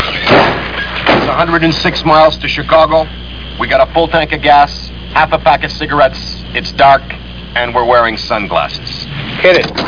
blues

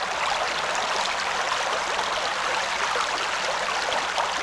brook; DAoC

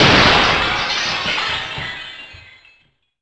GLASS

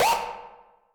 noise

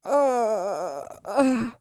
groan; Pacifica; Singles Flirt Up Your Life

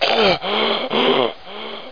gasp; Hexen II; player

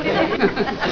Giggles; People; Sound Library 2000; Wayzata Technology

